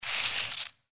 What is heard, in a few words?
power attack vox; npc; cazadore